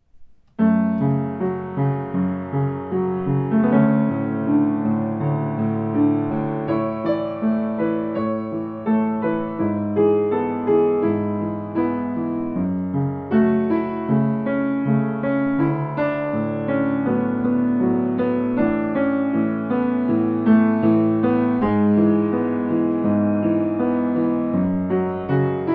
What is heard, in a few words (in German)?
Klavier einfach - Easy Piano Cover